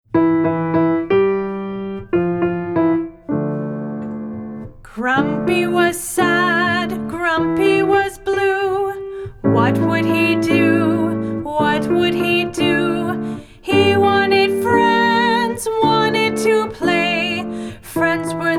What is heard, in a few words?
Grumpy